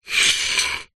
foley wood small block thin light scrape on hard ground; zapsplat